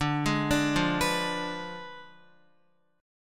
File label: D Diminished 7th D down Guitar; arpeggiated